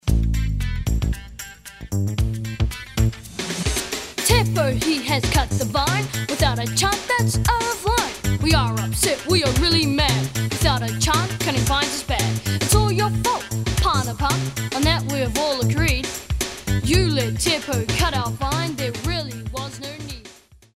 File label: Rap